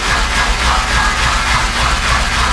idle, engine; Quantum Redshift; XBOX; zafir

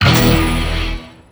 goal good; Tony Hawk's Project 8; XBOX

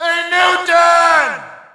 Shout, audience; UFC Tapout 2

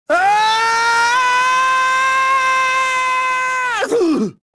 falling; rebel

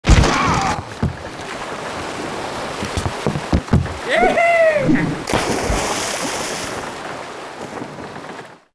duel outro amb lose; Sid Meiers Pirates; XBOX

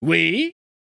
walk

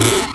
Chest Close; Finding Nemo; XBOX

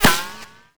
hit; Max Payne

